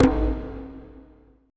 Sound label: TOTEM, ETH DRUMS